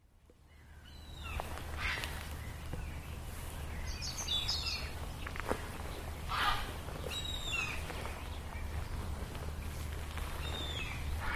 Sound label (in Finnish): harmaahaikara; kutsu; linnut